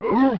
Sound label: lunge fish; ta-snes